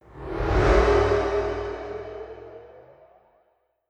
Slowdowning Whoosh; transition

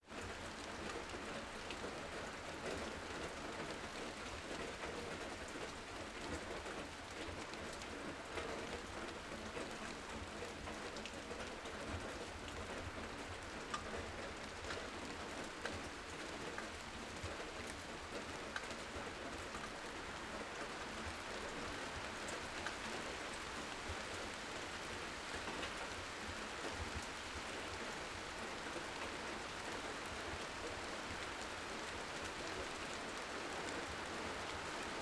Rain, Pouring, Pitter Patter

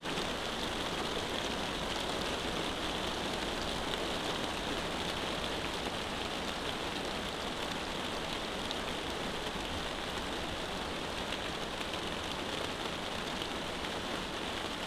Rain, INT, Plastic Roof, Pours

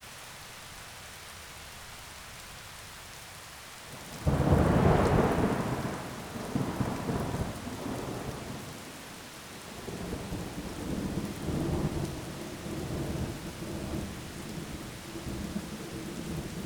Heavy Rain and Thunder in forest

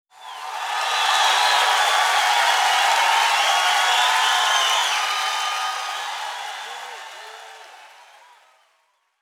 Crowd cheer short; people; applause

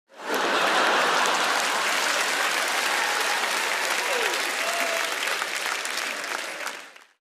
Crowd Laughs Cheering And Applauding; people; applause

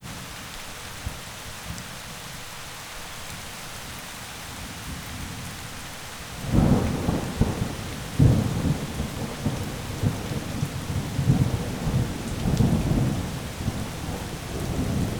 Heavy Rain and Lite Thunder in forest

